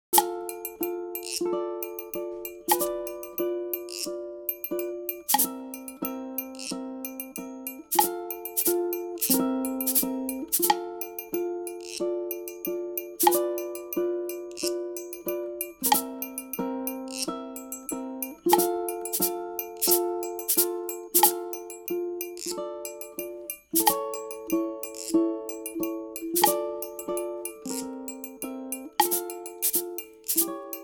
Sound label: Aura Lee uku flat4, ukulele; First line of Aura Lee with Flat-Four Strum.